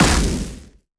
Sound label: aether hit strong; action; impact